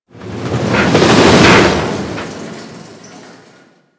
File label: Shipyards Conveyor Cart; Ambience; Props; Warframe; Grineer Shipyards